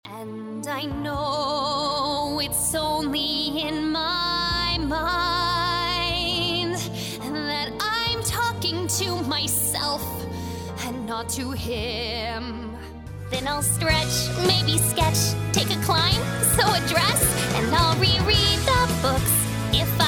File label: Singing